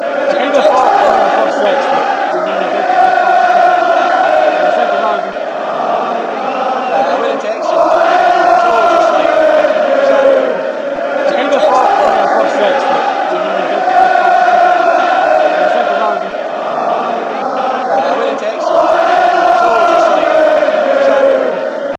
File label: falling in love with you